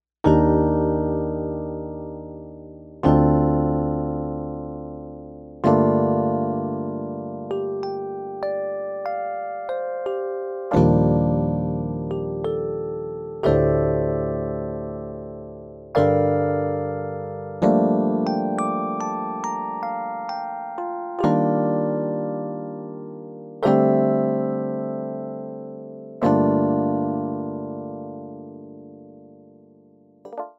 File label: Pf 70's Roadz